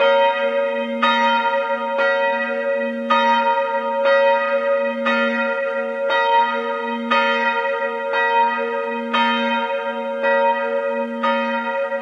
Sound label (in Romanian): Manastirea Crisan